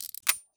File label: revolver draw, weapons; Counter Strike Model Pack; CS2